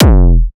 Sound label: kick lofi; kicks